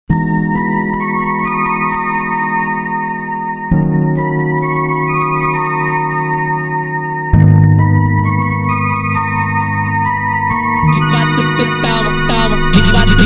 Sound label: Funk Super Slowed